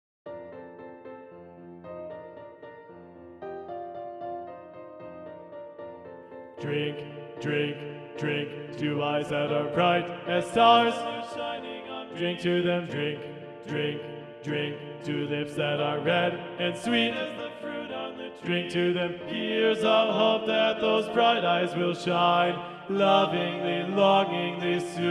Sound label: Drinking Song TTBB - Bass 1 Predominant